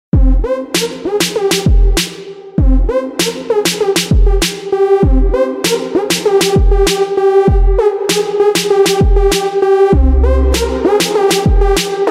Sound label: Black Beat